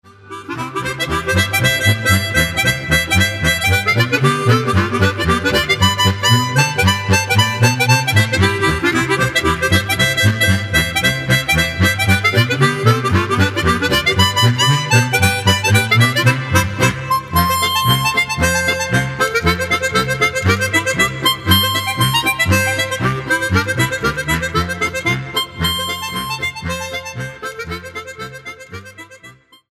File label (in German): klarinetten muckl; Traditional